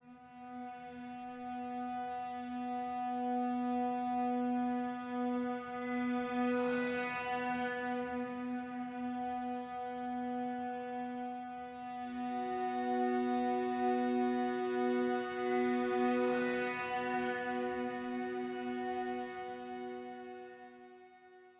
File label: CELLO